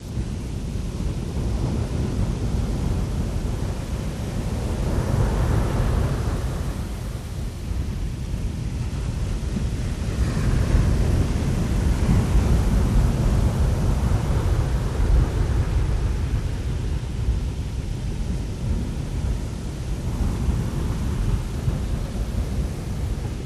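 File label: Ocean Steady Sea Wind, bow wash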